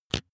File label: razor fold out hard; maschinen; rasierer